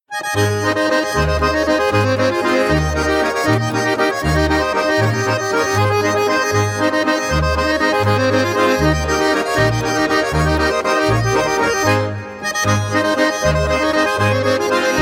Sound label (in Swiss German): Uf em Chrummhorn